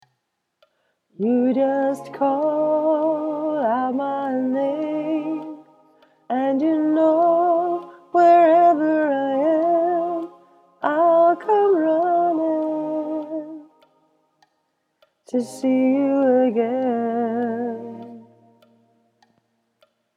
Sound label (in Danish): ALT 2